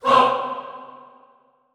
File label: HAH C3, Classical Choir, short hah; Best Service